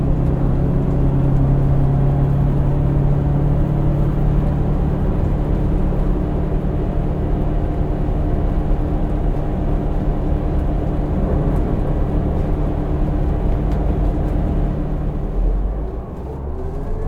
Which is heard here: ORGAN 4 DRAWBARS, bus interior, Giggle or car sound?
bus interior